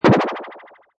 Cartoon Tymp Warble, Tympani Warble: swirley, twirley, boing; Comedy/Cartoon; Funny Sounds